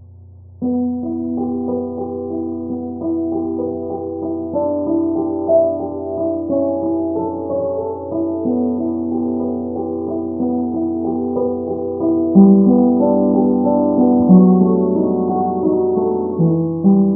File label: piano quad